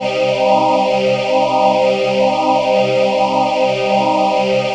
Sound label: PHASE PAD, ATMO PADS; Best Service; XX Large